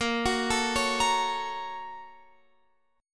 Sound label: Bb Minor Major 7th Sharp 5th, Guitar, Open F; BbmM7#5 arpeggiated